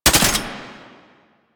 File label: Belt Fed Rifle Mech; Weapons; Warframe; Tenno; Frumentarius